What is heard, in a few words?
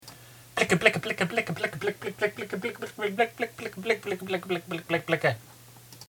raygun; rayguns